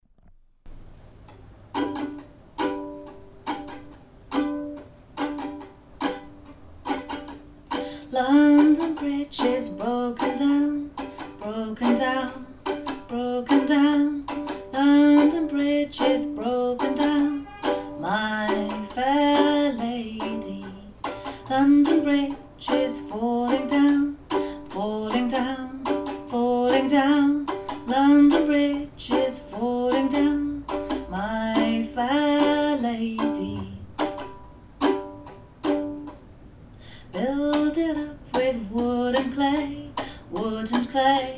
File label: London Bridge sung with fiddle accompaniment; singing histories